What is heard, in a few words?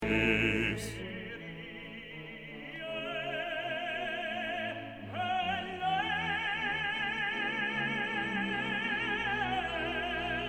Kyrie soli; requiem verdi